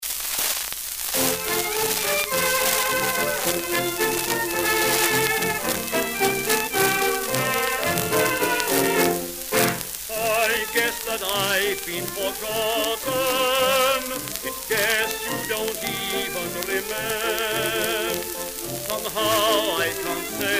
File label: Mono